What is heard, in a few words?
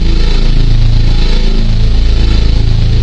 Pickups Powerups